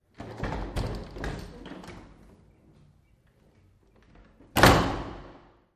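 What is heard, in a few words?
Door Open Close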